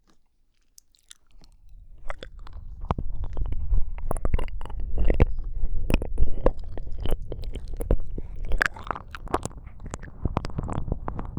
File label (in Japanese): いろんな両耳舐め, ASMR